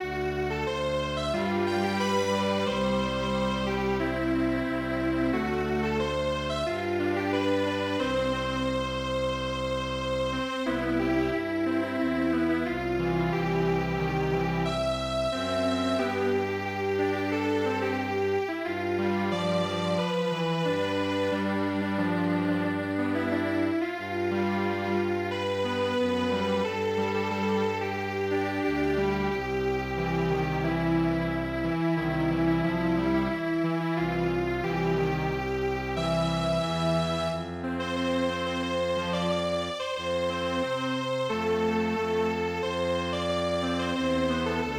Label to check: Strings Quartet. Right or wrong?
Right